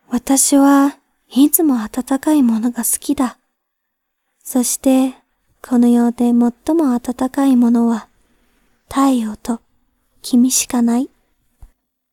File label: Vocals